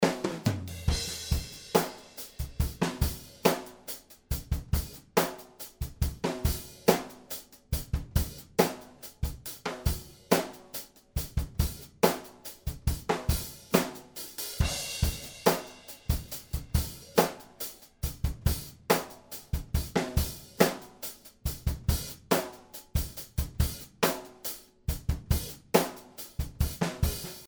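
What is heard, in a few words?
DRUMS BYPASS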